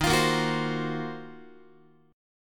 Eb Minor 6th Add 9th Eb down Guitar 4A,4E,5A,5D,5G sharp,6C sharp; strummed